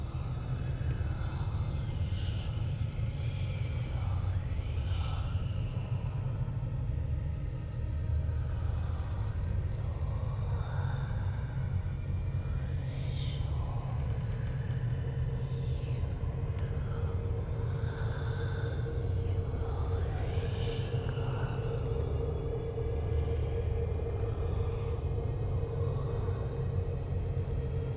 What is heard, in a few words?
atmos web memory; Hollow Knight: Silksong